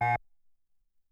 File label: Alarm; Earth and Beyond; EA Games